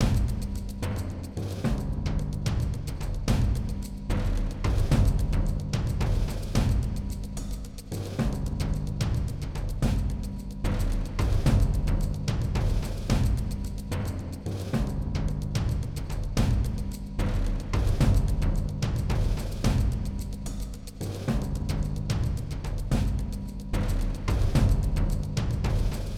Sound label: Garden 1 Perc